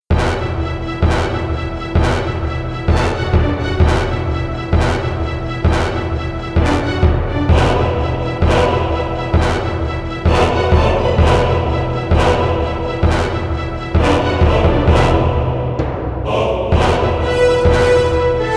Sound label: Call To Arms; Wars and Warriors Joan of Arc